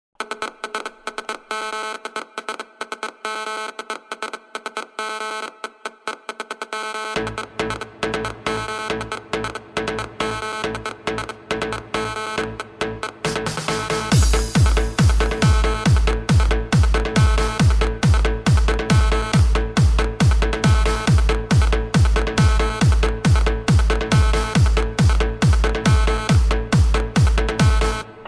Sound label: ring dj